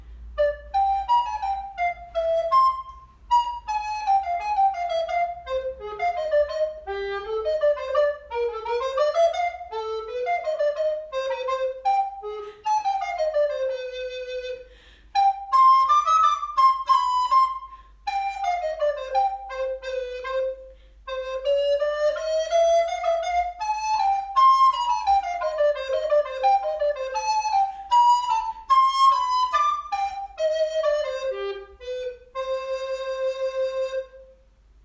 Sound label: Treble Recorder